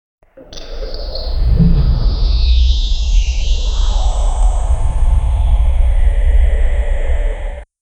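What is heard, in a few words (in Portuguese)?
ruídos tecnológicos e celestiais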